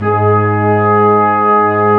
Brass Sections Ensembl.long; Roland LCDP06 Brass Sections; Lrg.Ensemble

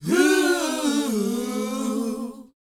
DANCE 120, Vocal; ILIO; Vocal Planet VOL-4; R&B & Dance

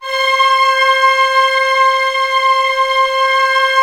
orchestra C5 FF; Sound On Sound - Peter Siedleczek's Orchestra

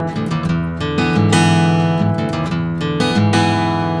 BARI RIFF, Blues Guitar; ILIO; Fretworks; 120 BARI RIFF

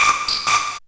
MORSE160, Hard & Loud Techno

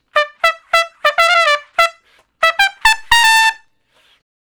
Trumpet Straight (E); Solos

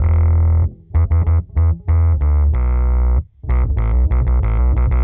Bass FX 95bpm E; musicradar; dub designer

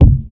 B.DRUM; USB Soundscan vol.51; AKAI

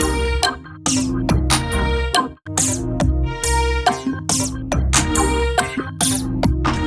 Dark Ride, Synchro Funk, Beats; Sonic Foundry (Sony Creative Software)

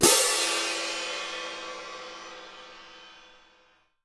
CYM MF -R, Percussion; Miroslav Vitous - Percussion & Harp

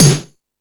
Ambient Rock, drum machine

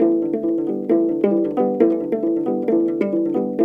HRP AF HRP, Afro Harp Phr

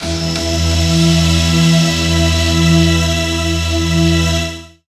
Intense, Pads; Zero-G Jungle Warfare 1, 2 & 3; 90 s Sample CDs

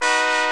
TRUMPETS; Greytsounds VOL-1

